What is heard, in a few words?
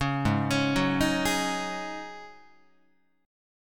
Ab 7th Suspended 4th Ab down Guitar; Ab7sus4 arpeggiated